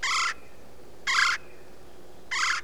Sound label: red bellied pecker, Bird Sounds